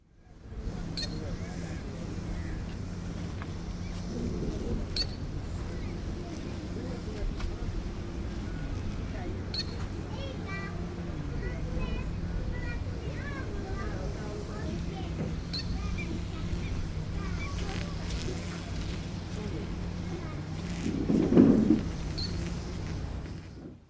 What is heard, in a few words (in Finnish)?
nokikana; kutsu; linnut